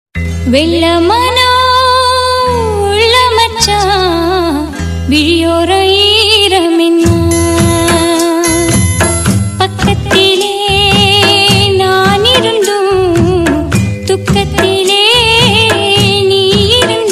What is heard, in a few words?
Songs